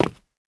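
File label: player tile, footsteps; csgom; cstrike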